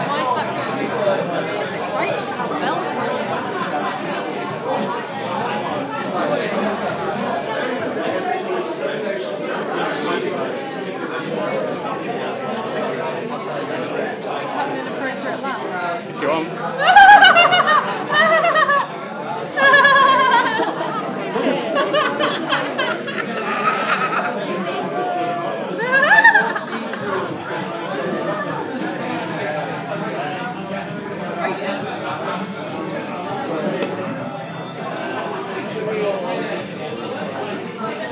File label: bar ambience, York; evil eye